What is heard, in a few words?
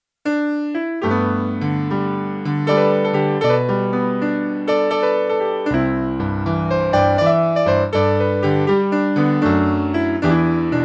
Amazing Grace (Cover Piano)